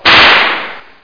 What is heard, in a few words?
whips; How Many Bugs in a Box